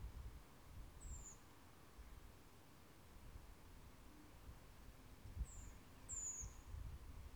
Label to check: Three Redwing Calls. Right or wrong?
Right